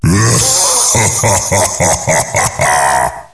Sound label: nade